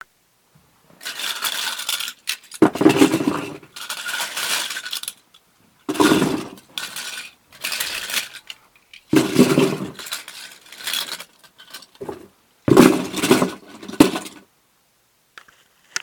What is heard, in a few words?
Putting Toy Cars In A Box